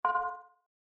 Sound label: alert